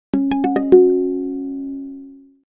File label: Email, Nokia Alerts; Windows Phone apps